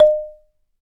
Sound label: BALA, Balaphone; Roland LCDP11 Africa VOL-1; Bala & Xylo